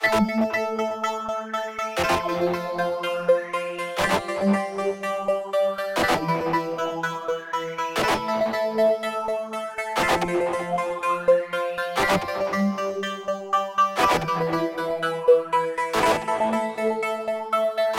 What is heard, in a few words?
Anxious Loop